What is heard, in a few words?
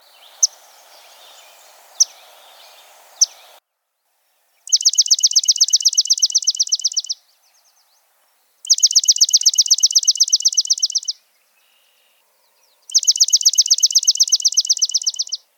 Swamp Sparrow